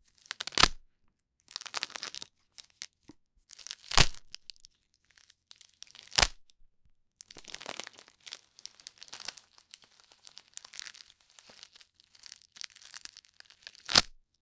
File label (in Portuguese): desembrulhar um presente, ribbon